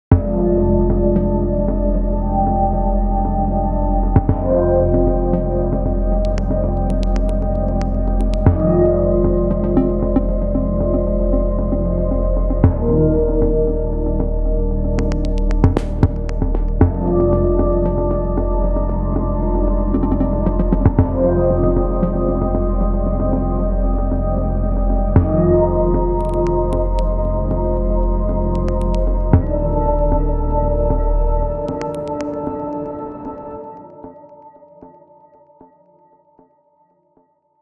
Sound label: Sparse Chords; beyondium; android ios